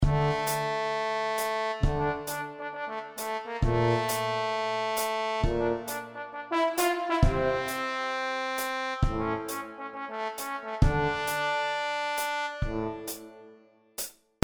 Trombone tuba

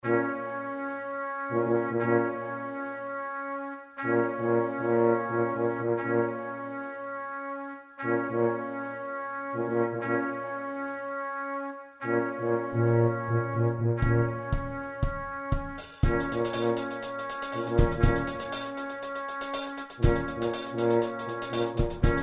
Trompette Tuba Batterie

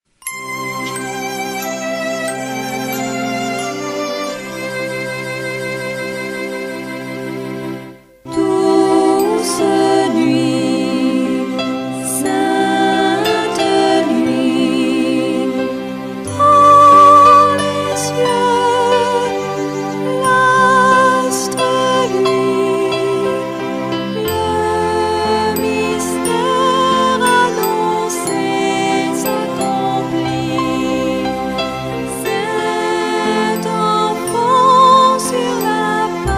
douce nuit avec paroles version courte

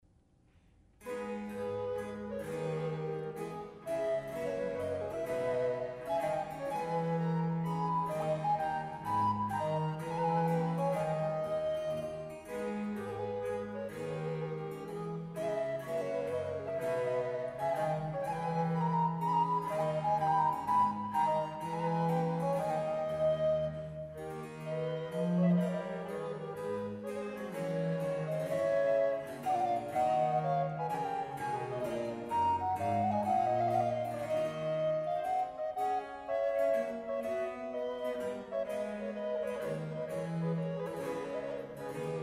air des flutes

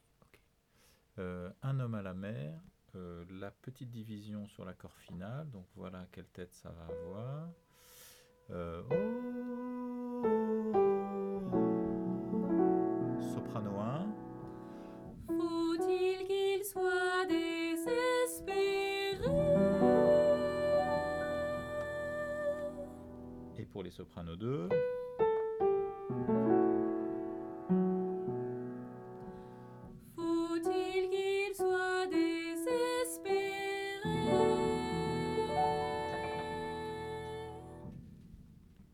accord final Sop 1 2; Pinocchio

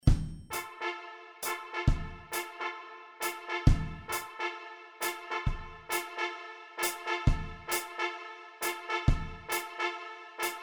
Trompette

